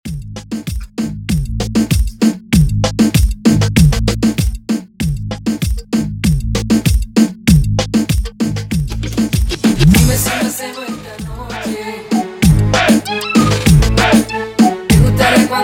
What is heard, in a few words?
intro break outro 97 BPM